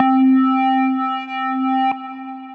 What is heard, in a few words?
Sine, Synth Lead; A Trying Sine; Ableton; Factory Packs; Chop and Swing